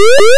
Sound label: battlezone start; myth